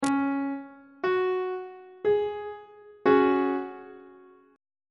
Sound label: c sharp suspended 4th chord on treble clef